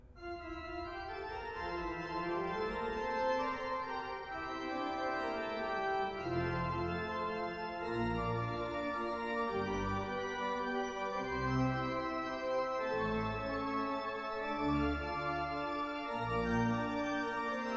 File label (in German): Praeludium Nr. 4 BWV 556, breepleinkerk; J.S. Bach; Breepleinkerk 2024